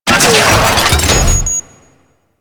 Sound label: Fortress Rocket Turret Deploy; Ambience; Warframe; Grineer Fortress